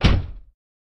Car Door Slam Int Hit

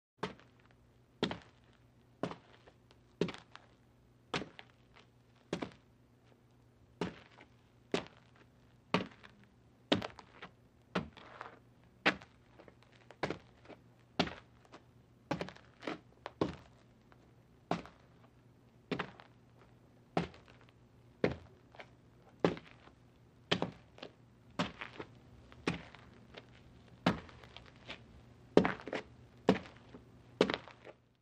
Footsteps Male Slow, wood

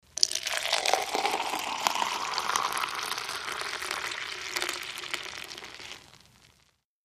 Beer Pour Classic Com; eating & drinking

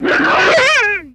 Bear Scream Moan

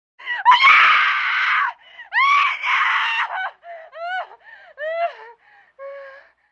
Female Screaming Ter

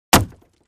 Crate Palette Type 2 Hit with Sledge Hammer

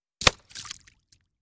Bullet Impacts Water

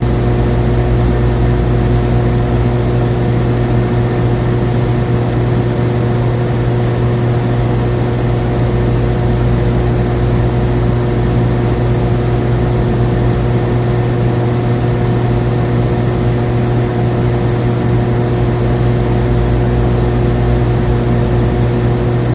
Elect Hum Lots Motor, buzz & hums

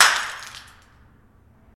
Light Bulb Break; lightbulbs

